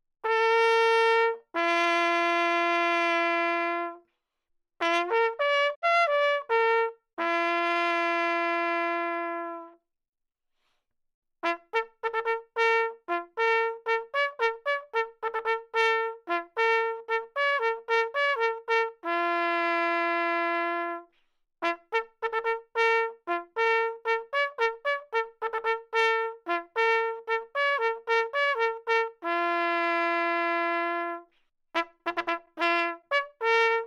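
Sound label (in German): Begrüßung, Allgemeine Signale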